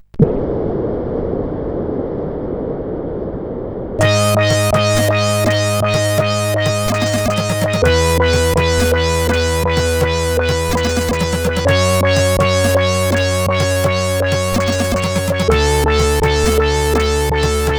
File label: sid CSG8580R5; Ossi Intro